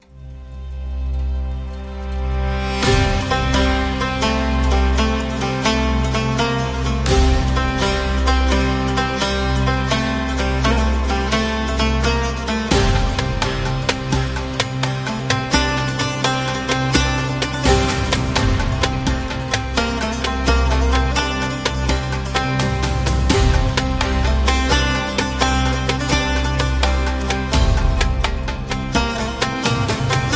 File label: Oud; ramadan 2025